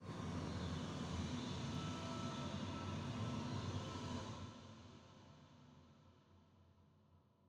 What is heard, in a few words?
Mountain SFX, Rhythm Temple SFX Energy Crystal Fill Longer